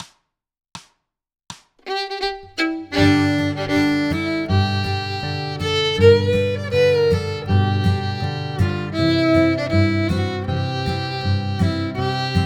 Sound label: Fiddle Worried Man Blues; Jack Tuttle’s Bluegrass Fiddle Primer; lots of bluesy slides, simple melody break